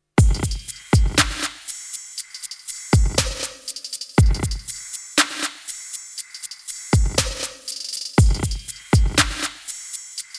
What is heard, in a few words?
Drumloop Reverse Cluster; DRP-20